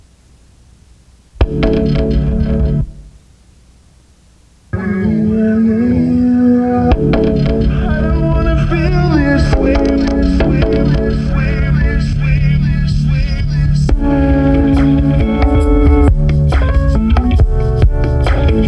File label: Remix